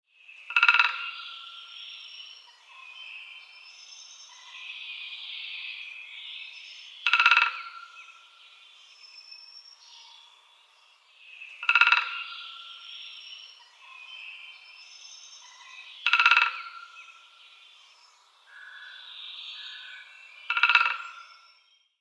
buntspecht; vogelstimmen

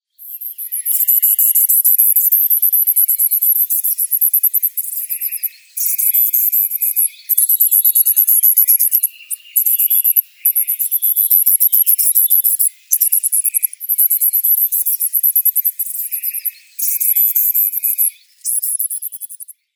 waldmaus; vogelstimmen

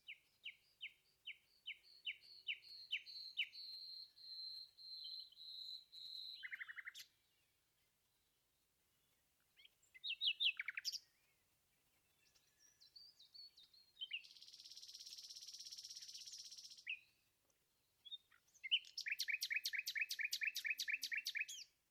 Nachtigall, Vogelstimmen

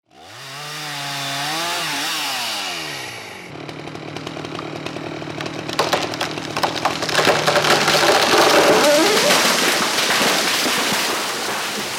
baum faellt; vogelstimmen

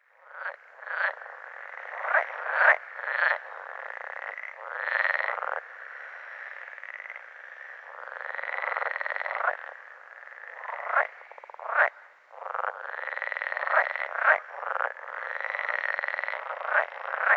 teichfrosch; vogelstimmen

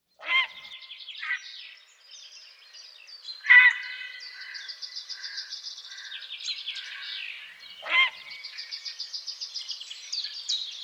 graureiher; vogelstimmen

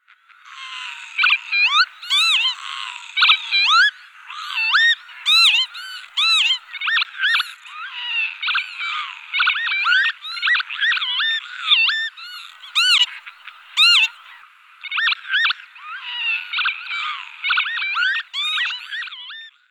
kiebitz, vogelstimmen

